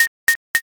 scissors

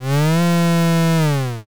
cow

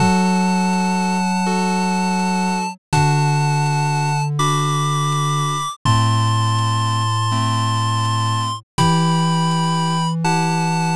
bgm mellow

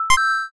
buy

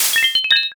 dish break

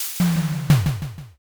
fridge

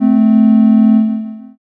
horn

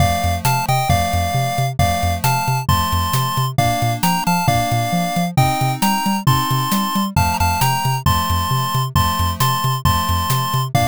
bgm street

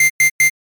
oven beep

